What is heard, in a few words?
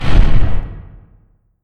thud